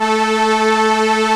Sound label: STR ARP, ARP Strings, ARP Slow Str